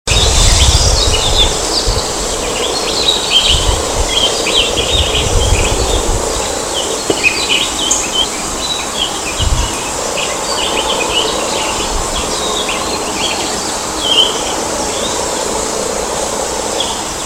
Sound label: kashiradaka